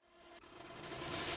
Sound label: mood intro battle